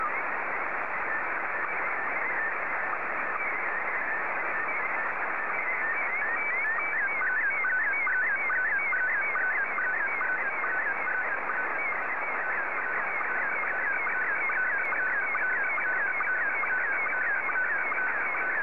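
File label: SSTV, Scottie 2; JA3QJQ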